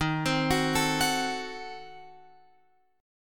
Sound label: Eb Diminished 7th Eb, Guitar Open F; Ebdim7 arpeggiated